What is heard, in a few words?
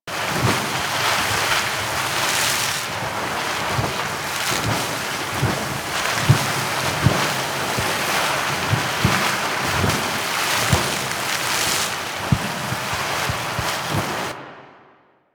Aqua Board Water Splash Loop; Warframe; Yareli